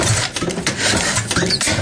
blackstone oil engine loop, 1909 10HP Blackstone Oil Engine